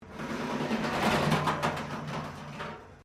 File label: METAL BIN SCRAPE WIRE FENCE; Metal (Sound Effects:Materials)